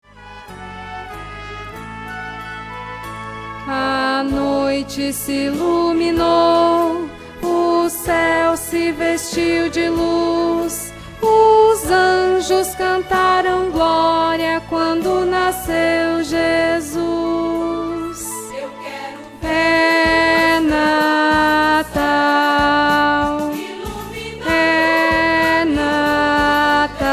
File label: contralto